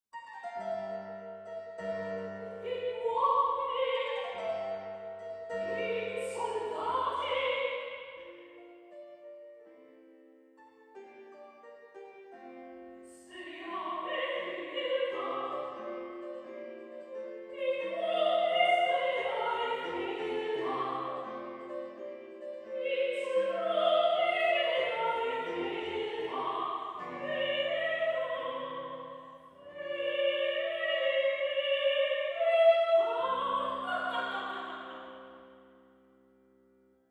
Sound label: Cardioids LS